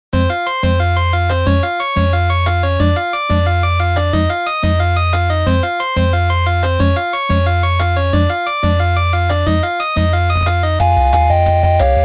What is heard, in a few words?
bgm 8bit; maou